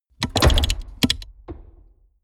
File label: mechanics medium reverberant